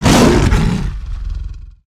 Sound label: chimera attack hit